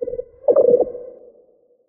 Watcher Idle; Watcher (Legacy)

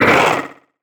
HUSK attack

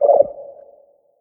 Watcher attack; Watcher (Legacy)

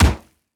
Limb Blunt

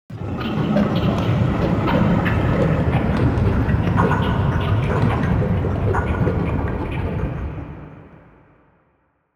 Carrier Idle

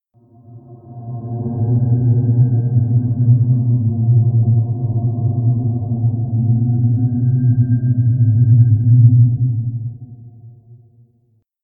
ENDWORM attack

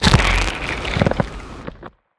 damage

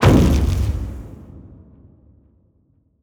CARRIER harpoon Hit

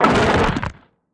HAMMERHEAD damage

